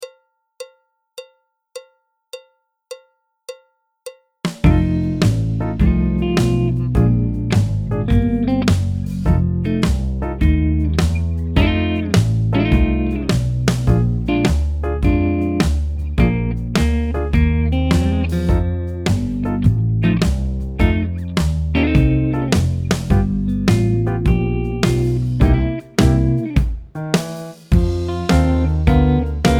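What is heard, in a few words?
Blues backing track, Jazz; clarinet, flute, tenor; Jazz Syllabus Level 3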